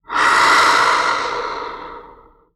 zombie

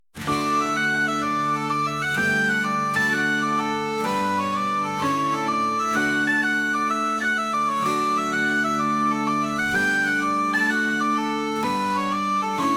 calm

